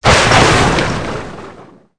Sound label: thunderclap